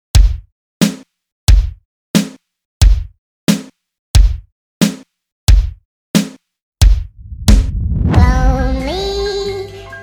RNB; remixplanet

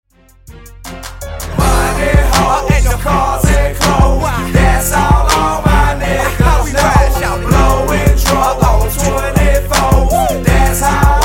Throwback, 81Bpm; remixplanet

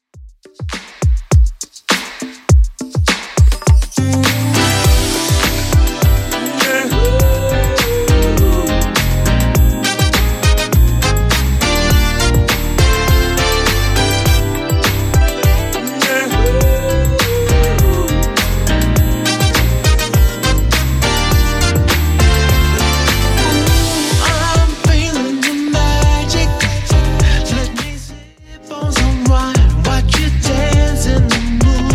Smooth 70s 102bpm; Redrum

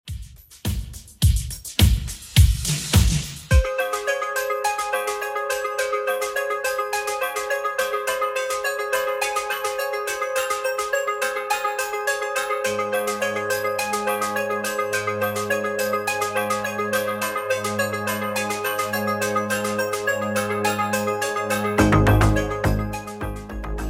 80s Redrum 105bpm

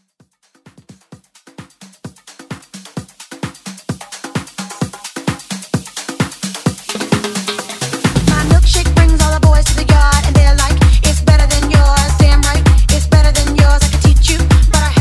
Tribal House

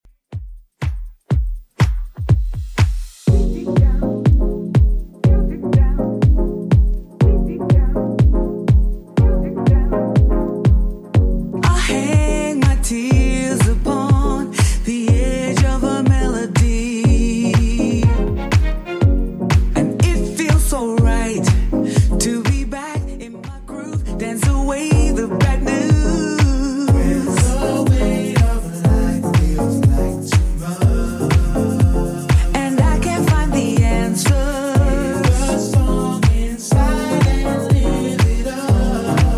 80s Redrum 122bpm

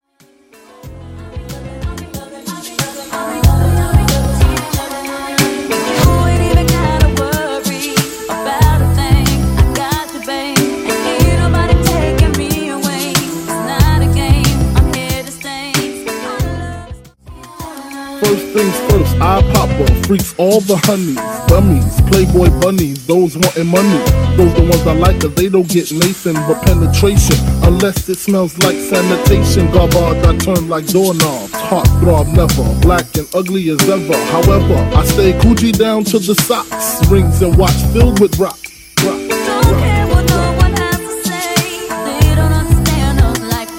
93 Bpm; Mashup

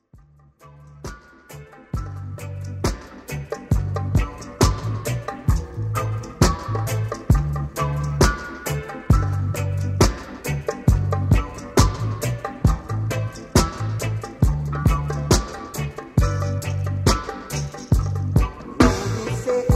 Reggae Mixshow

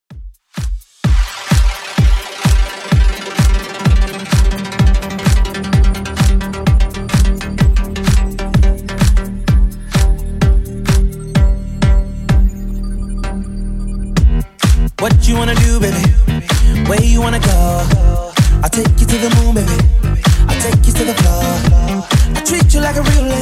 2ks Redrum 128bpm

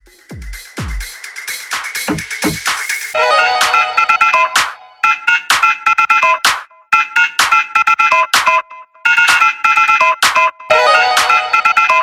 Party Intro Quick Edit 127 BPM

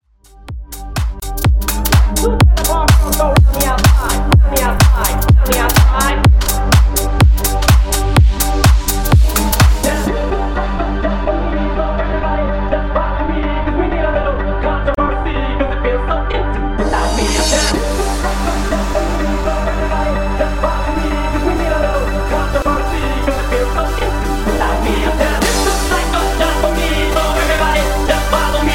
Bootleg Edit 125Bpm; djyan

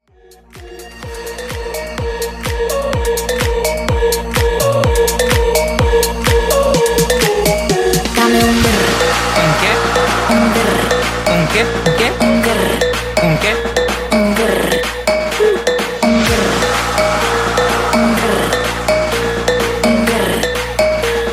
Club Mashup 126Bpm Clean

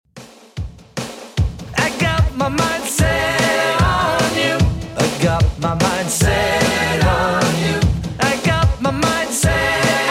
80s Redrum 149bpm

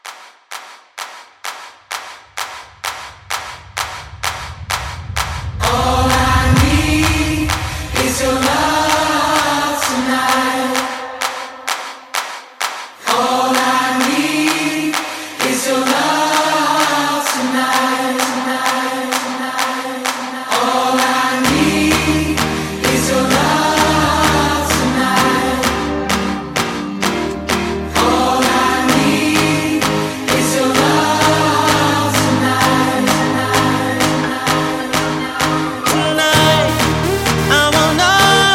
Clap

